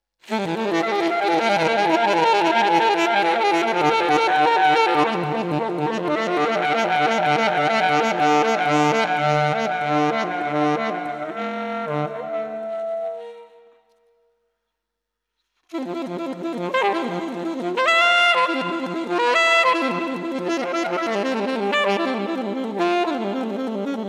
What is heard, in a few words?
solo